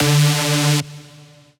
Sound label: Techno Synth Shot D; Synth One-Shots; Ghosthack; 45 Free Techno Synth Shots 2025